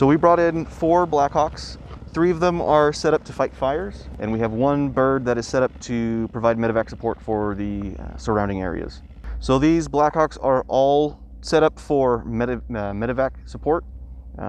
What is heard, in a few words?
blackhawk helicopter; kwso news